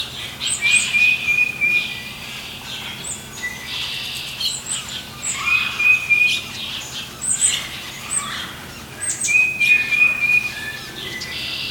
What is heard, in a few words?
birds; OpenValley